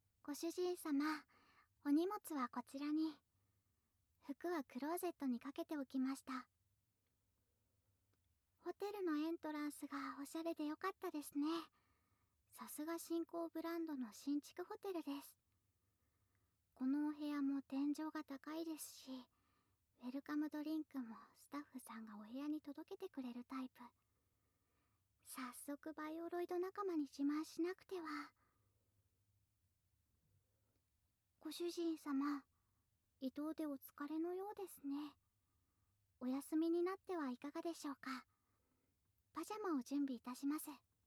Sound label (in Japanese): 耳舐め、本番えっち; バイオロイドは結ばれたい; SEなし